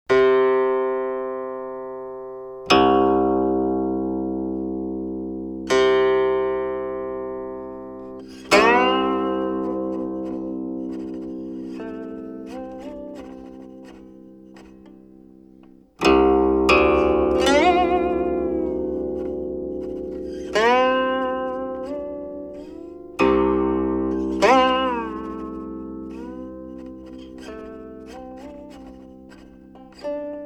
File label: guqin